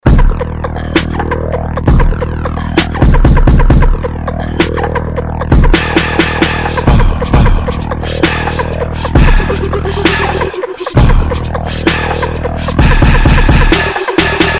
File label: bounce remix ringtone